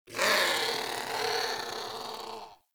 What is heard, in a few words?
Creature; Ambience; Corrupted Ode